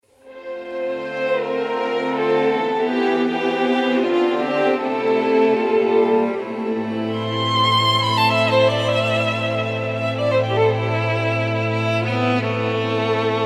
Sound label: Violin Concerto opening